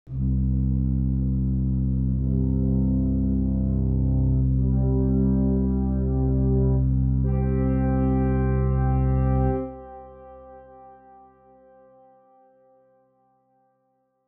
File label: organ